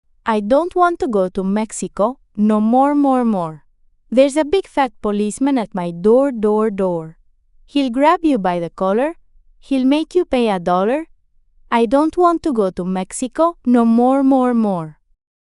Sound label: I Dont Want to Go to Mexico fem mex